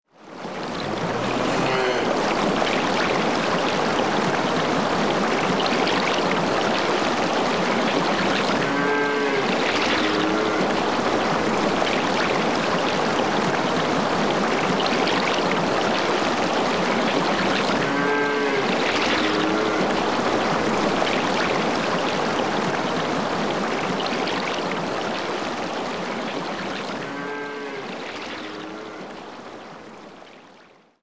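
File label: ambience hitchhike; Wario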